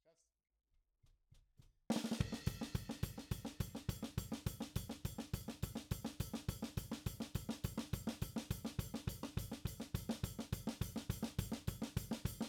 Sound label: SNR top